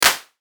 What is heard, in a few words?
clap